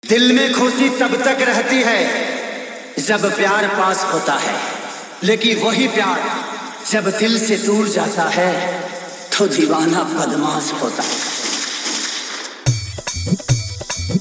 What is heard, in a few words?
New Bhojpuri Dj Remix Song